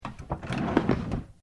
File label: Drawer plastic cabinet open slow; Various domestic drawers; Household; SoundEffects Foundation2